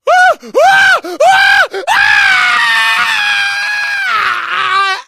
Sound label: death scream, survivor voice